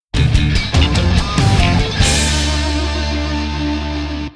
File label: Jingle Lose; ACR Drift